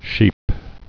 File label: sheep